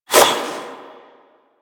Army Replicant Proj Throw; Warframe; Warframes; Hoplite

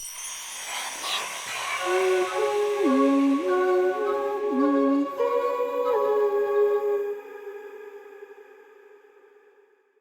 Music Success, Player Instrument; Arbinn Hills

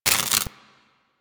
Worker Welder Start, weapons; Grn Worker Welder

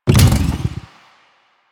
Rev From Stop, Motorcycle; Motorcycle Ninety Nine

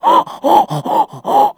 Infested Runner Breathe Quick; Warframe; Runners

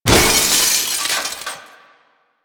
Glass Window Break; Warframe; Ambience; Nineteen Ninety Nine

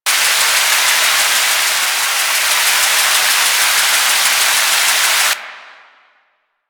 Field Sprinkler; Warframe; Duviri

